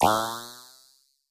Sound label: synth boing; boings